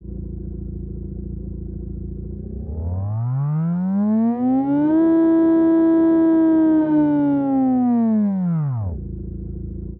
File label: rotary x8, engine sound